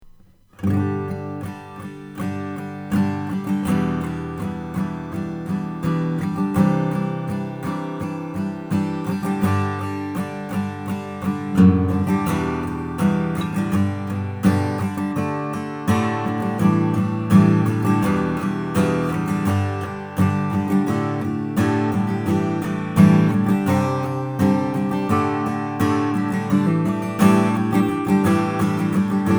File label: ted davis slope d red spruce top; 1998